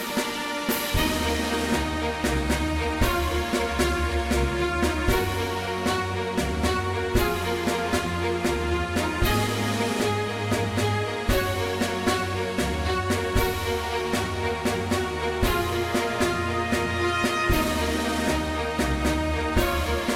Song Menu; Hellangel

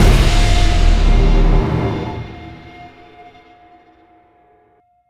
Ambient Sting

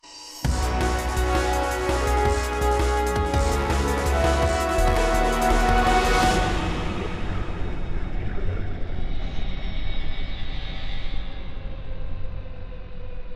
Win; apex legends season 12; Octane

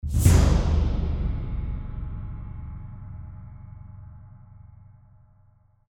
Swell; Warhawk; ps3

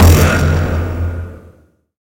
Music

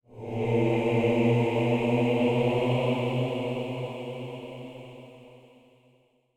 amb hymns eerie; gamerip; blackfaun

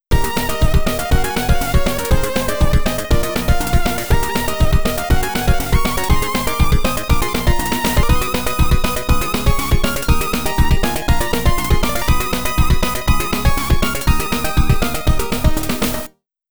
POWER UP (YM2608); New City Hero

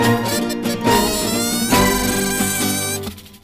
music world music shooter outro

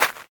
Move Gravel

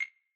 Phone button

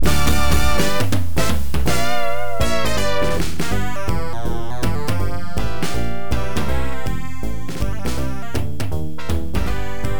Jackpot Collected, pinball; bad cats; williams

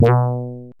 Shield; Sonic WindowsZone

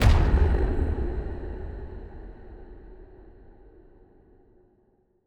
Stingers sting monster

